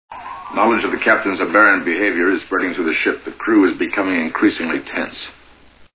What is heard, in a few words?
tense